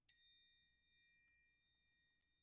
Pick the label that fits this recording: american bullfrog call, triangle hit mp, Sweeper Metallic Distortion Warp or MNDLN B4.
triangle hit mp